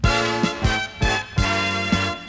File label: Dun dun dun dun dun